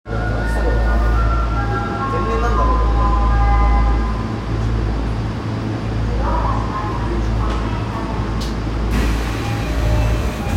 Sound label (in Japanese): 丸ノ内線荻窪東